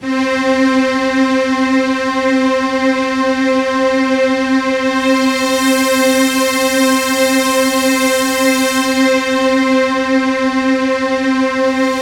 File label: C4, Synth Line; 90 s Sample CDs; NiceBeats; SynthLine VOL-15